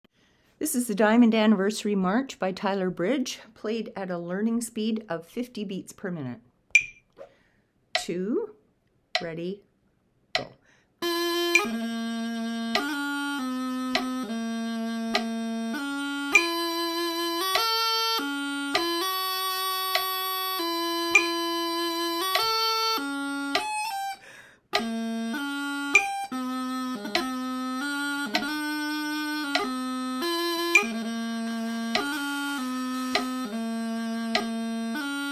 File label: March 50 BPM, pipers